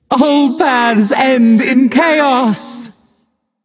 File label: "yay"; smite; voicepack; nuwa